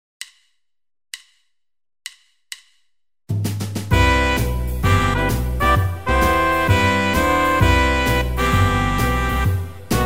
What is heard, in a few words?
Jazz